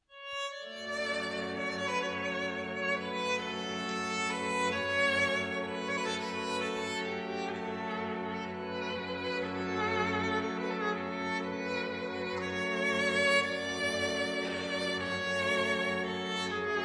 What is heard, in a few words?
Aria For Strings